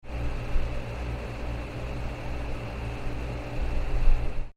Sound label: ac unit; HSXA19